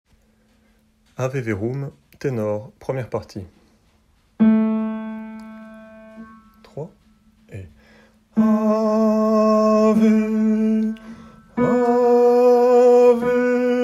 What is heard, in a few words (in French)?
Ave verum tenor; Mozart; Ave verum P1